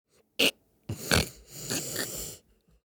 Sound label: Little boy wakes up sudden snore